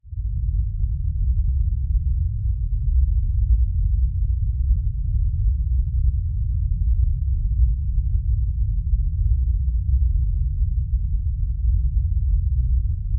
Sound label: Steady Versatile Rumble